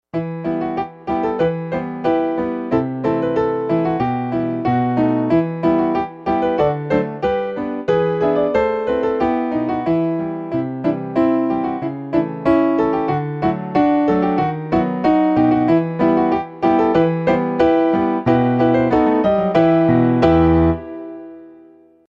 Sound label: dance